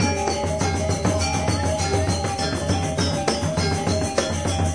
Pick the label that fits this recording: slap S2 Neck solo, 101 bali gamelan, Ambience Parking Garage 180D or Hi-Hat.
101 bali gamelan